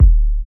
kick single shot d sharp key, Loudest frequency: 57Hz; kick drum samples